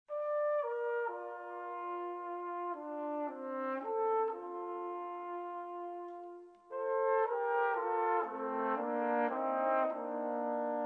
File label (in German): Pro Brass Lippenjodler